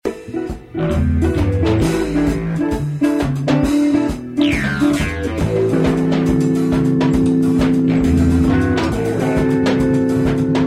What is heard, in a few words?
solo